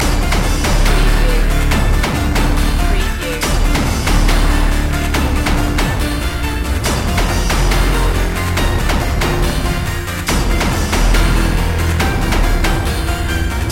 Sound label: binary clash loop